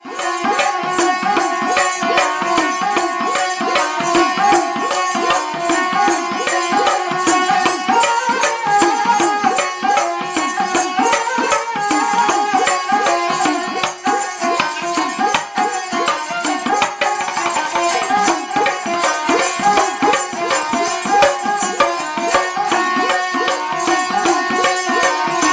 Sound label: punjabi sarangi